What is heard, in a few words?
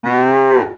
Cow, Yamaha DD-35